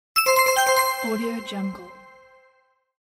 ringtone

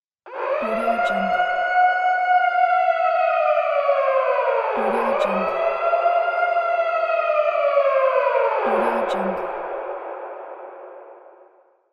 siren

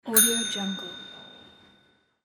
bell

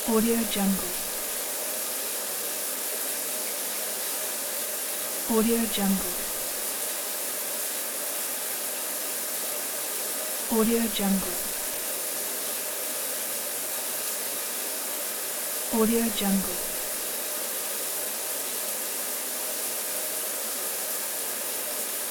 bathroom

